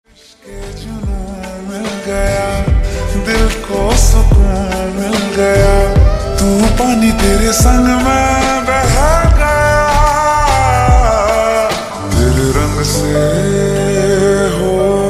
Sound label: Slowed Reverb Ringtone